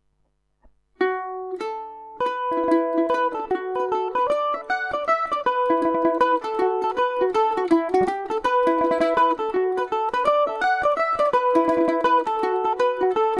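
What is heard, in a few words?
E dorian paris swing revelation, irish mandolin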